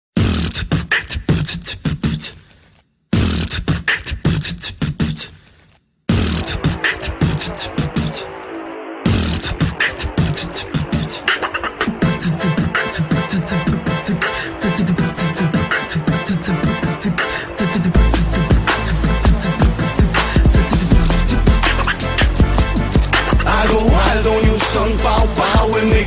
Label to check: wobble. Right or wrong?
Wrong